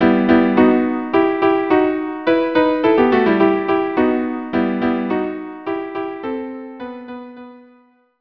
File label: sevivon violin duo